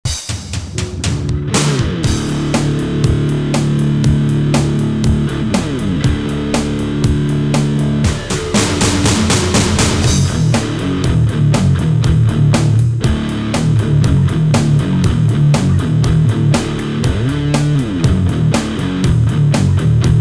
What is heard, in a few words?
riff